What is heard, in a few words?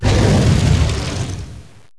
attack boss Ranged; Repatitis